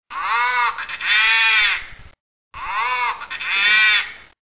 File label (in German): ente